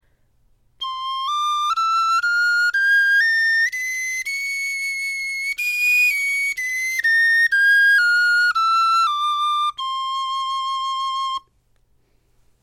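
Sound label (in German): tonleiter, Knochenflöten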